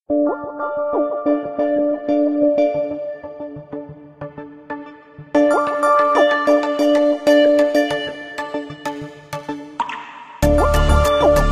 Wah Sounds, alarms; Honor X7a

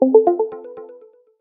Lilting

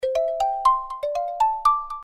notification; Gionee; GN206

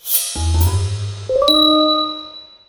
Funny, notifications; Fly IQ430 Evoke

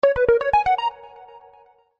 Hour Indication; LG KC910 Renoir

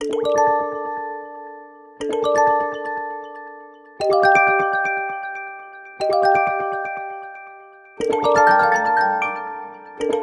Water Song; Honor 600 Pro China; ringtones

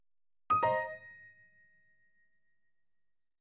Charging Started, ui; FCNT Arrows Alpha

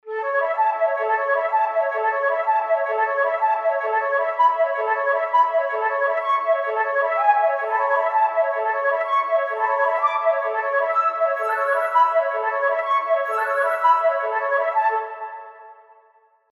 Flute, Dew Drops Alarm; HTC; Sound Sets

